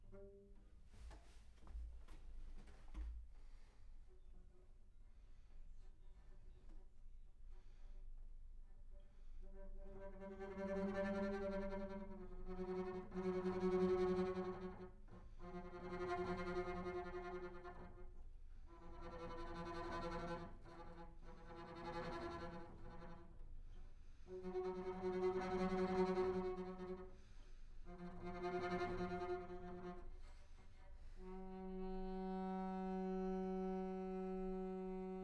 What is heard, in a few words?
Bass Room